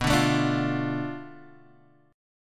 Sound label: B-Minor 7th Flat 5th B down; Bm7b5 strummed; JGuitar